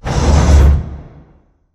pod door sm verb close slide; Titanfall 2

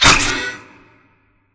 Fence Flip Start; Titanfall 2; Boomtown

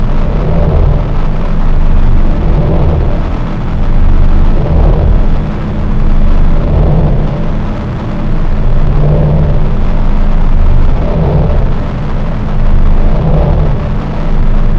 blue turbine loop mid; Titanfall 2

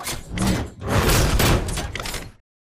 melee gun slam left; Titanfall 2

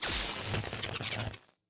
arc titan sizzle; Titanfall 2

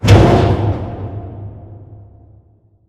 Prop Warehouse Prop Rack Stop Dist; Titanfall 2; Boomtown

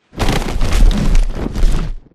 flyer picking up soldier dive close; boneyard; Titanfall 2

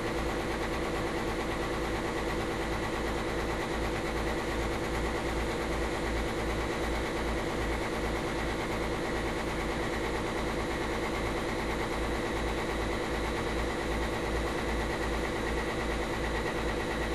generator small; boneyard; Titanfall 2

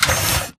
Mvmt Servo Light Short; AI Stalker; Titanfall 2

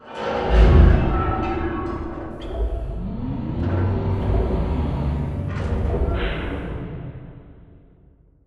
Lower Arm First Retract; Boomtown; Sideways Town; Titanfall 2

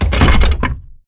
Metal Jog; Titanfall 2

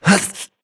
grunt hits; Titanfall 2

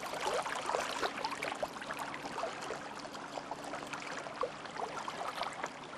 amb dock water; angel city; Titanfall 2

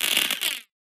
bullet energy titan shield spang cluster; Titanfall 2

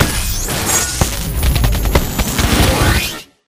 Reaper Nuke Destruct Warmup; Titanfall 2

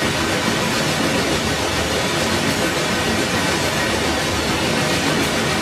generator ambient loop rings spin; coop; Titanfall 2

